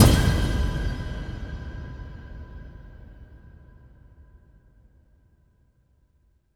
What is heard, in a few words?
METALICOUS; Spectrasonic Distorted Reality 1